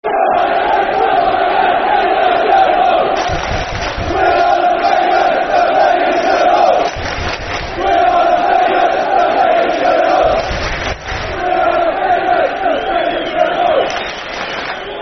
We Are The Famous Yeovil, Football Chant; Yeovil Town Ytfc; fanchants

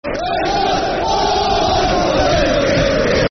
Football Chant (Soccer Songs); We Love You Hartlepool; Hartlepool United Hufc

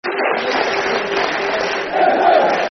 Ipswich (Clap), Football Chants; fanchants; Ipswich Town Itfc

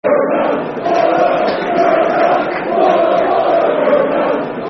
Football Chants (Soccer Songs); Warnock You're A Cunt; Ipswich Town Itfc

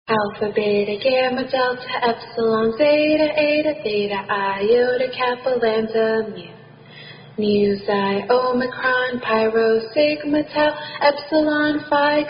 Greek Alphabet Song

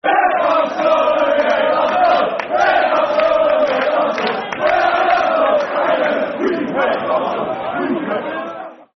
Football Chants (Soccer Song); We Hate Arsenal; Tottenham Hotspur Thfc

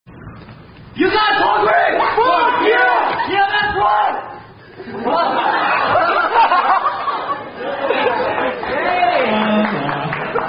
Chant; Are You Guys Hungry

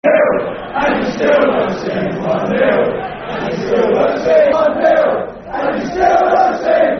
1 Nil And You Still Don't Sing, Football Chants; Crystal Palace Cpfc

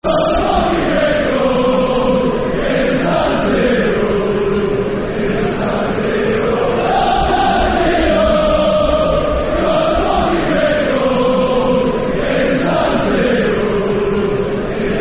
Football Chant (Soccer Song) Leeds; Dom Matteo; fanchants; Leeds Lufc

